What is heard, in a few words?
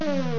pause open; zsdx